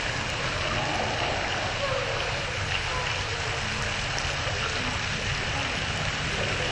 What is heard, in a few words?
screaming; ash cave 2013